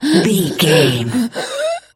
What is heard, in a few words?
voice female a breath out of air